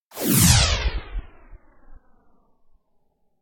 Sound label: Whoosh Phased Thin And Fast Stopping; Hollywood Edge; sound designer's toolkit v2